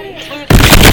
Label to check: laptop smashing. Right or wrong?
Right